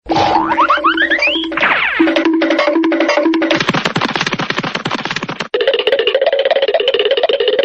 goofy ahh runnin

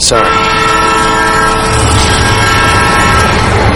train horn

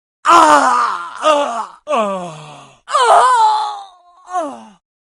wow human death

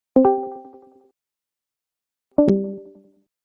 discord call join

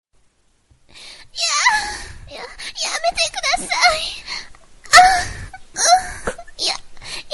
ah cute anime voice

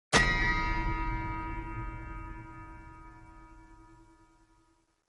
low honour sound; rdr2

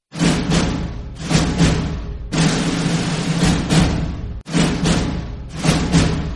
fanfare; 20th century fox

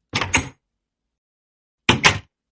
door; minecraft; meme

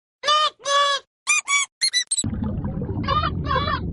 noot noot jumpscare

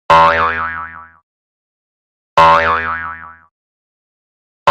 boing boing

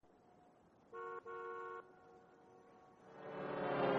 deep note; homers

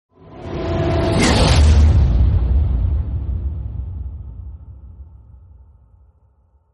gun shot

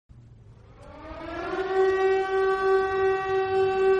sinistre siren; silent hill

